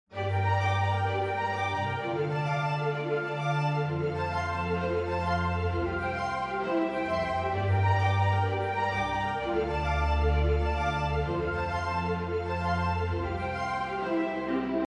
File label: Strings; VSL App